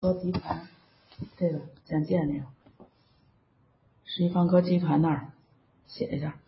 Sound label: recorder